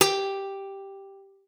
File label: G4, GUITAR; Xsample VOL-2